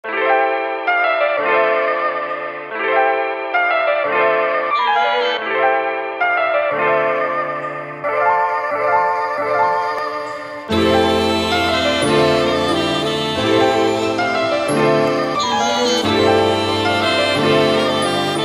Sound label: Jazz Bossa